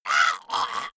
monster hit high; schreie

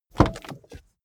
car bmw e46 exterior door rear open short; Cars

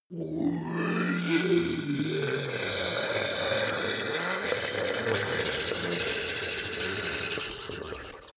ice golem melt groan; koerpergeraeusche; comedy-cartoon; soundpool

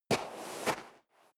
step sneaker sand scuff; schritte

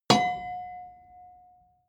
metal impact girder

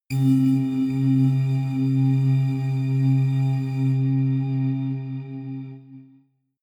synth hum echo high, drones

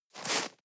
step snow walk single soft; natur-tiere; schnee

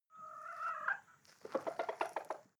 hens clucking; natur-tiere; huehner

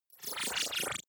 wobble hover swell medium; energie

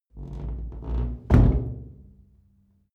old door close

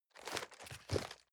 cardboard box medium turn soft; objekte

